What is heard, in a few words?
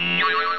boing!